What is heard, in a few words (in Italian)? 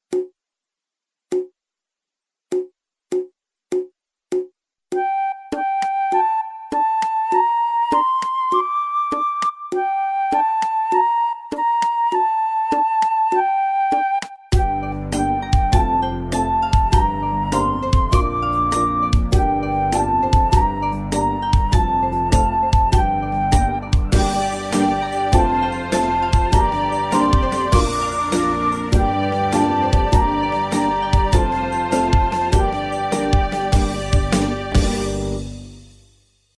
base con melodia